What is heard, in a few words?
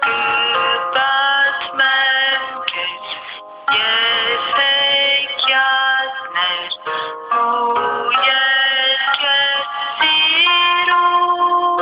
Lullaby